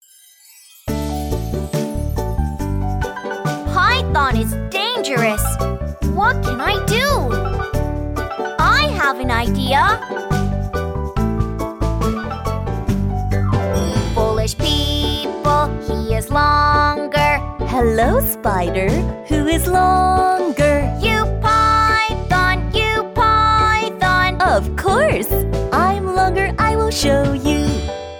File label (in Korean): 영어여 동요